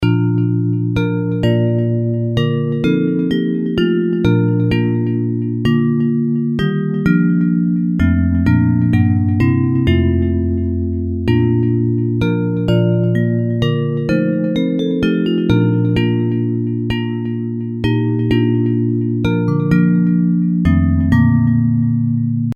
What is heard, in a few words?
Bells, hymn